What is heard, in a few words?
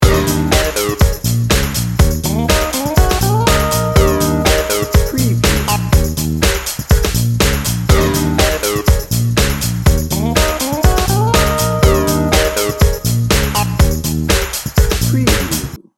party starter loop